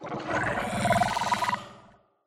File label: grenade picked reaction; infestation; Half Life Alyx; xen